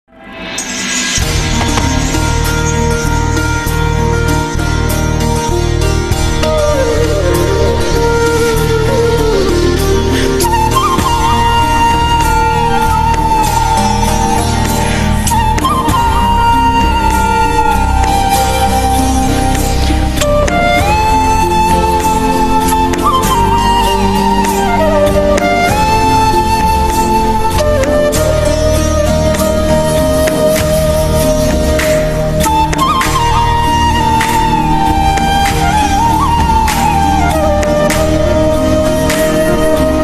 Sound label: Romantic Music; Karn Uruvi